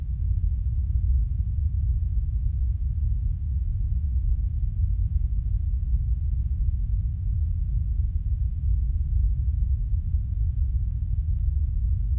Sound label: 40-80hz PINK -20dBFS